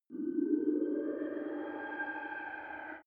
far fighting racer arrival, raumschiff

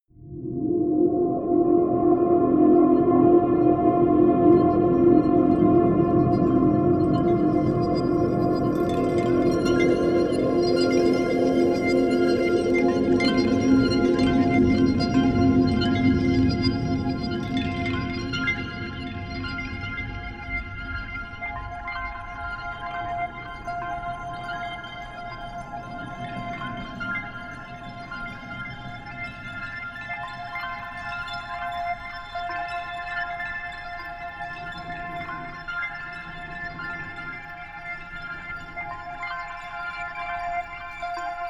underwater bells; flaechen

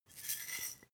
herbs tilt; soundpool

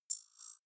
zoom in; signale

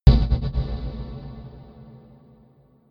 energized fuse; whooshes-rises; hits-impacts

